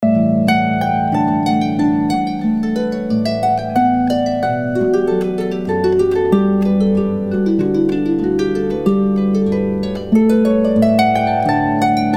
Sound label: Harpist; Orlando